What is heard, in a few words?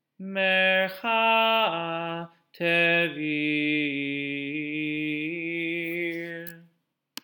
Torah Trop Pattern